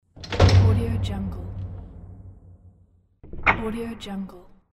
prison door

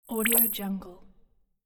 menu swoosh; audioheart

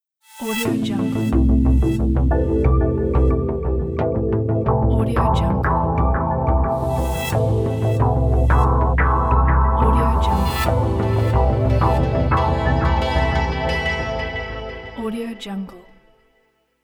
synth logo

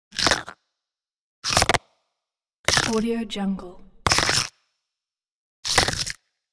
primitive man vine shoots; prosoundfx

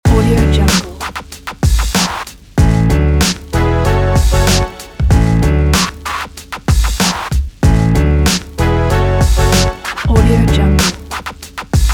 hiphop scratch loop

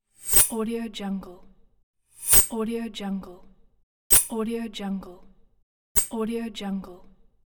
iron swishes; kaelv

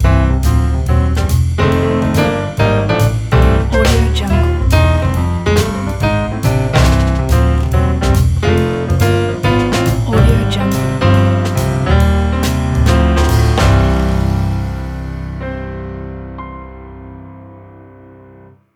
jazz ident

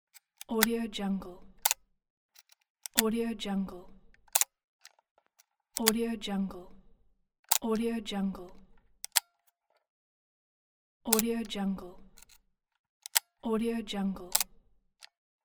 old camera shutter

